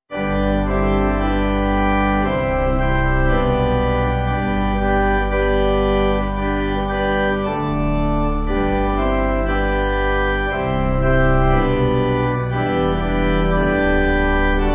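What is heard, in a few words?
Pipe, hymn backing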